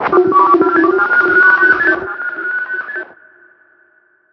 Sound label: icarus beacon sound recreation; sunshine movie